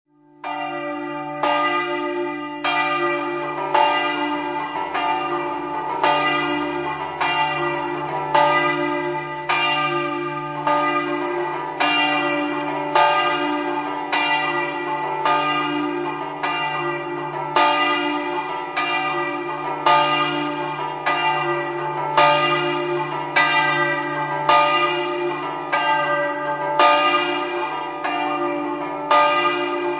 saletto, campane

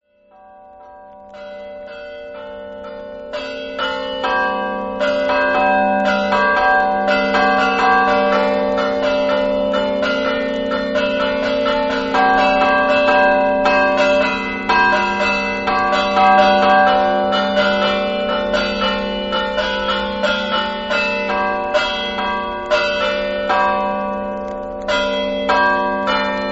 chiasottis, campane

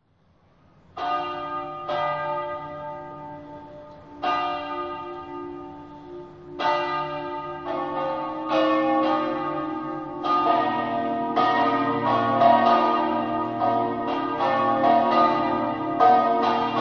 tarcento, campane

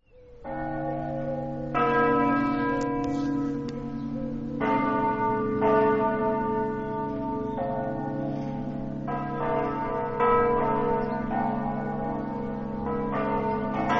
mortegliano, campane

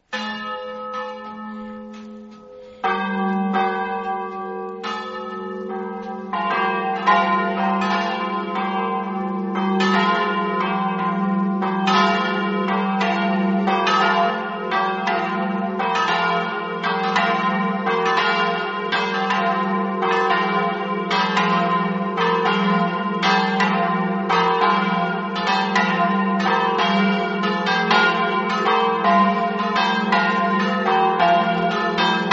poggio, campane

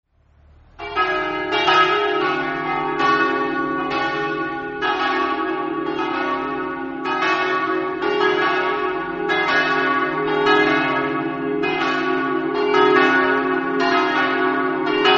campane, Osoppo

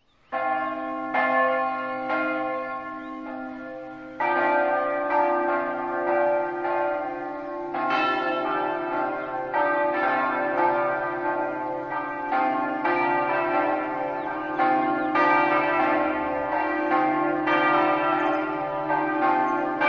cavasso, campane

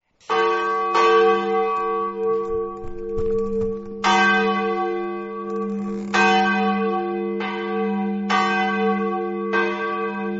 prossenicco, campane

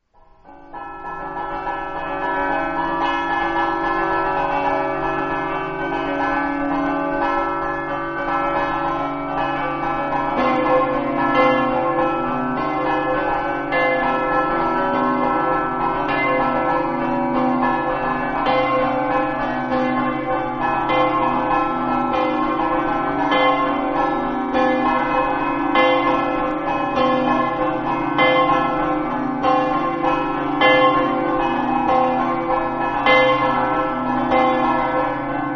enemonzo, campane

